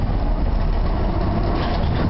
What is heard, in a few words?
Motor, Train; OpenBVE